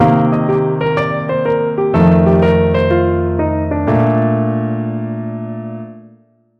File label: ii V I jazz licks, piano